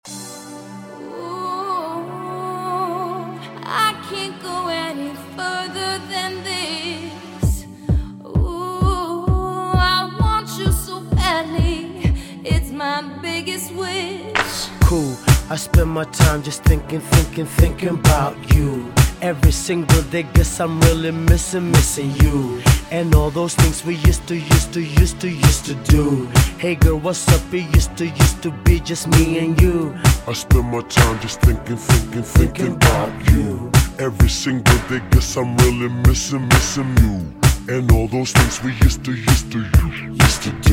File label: music survivor; zombie escape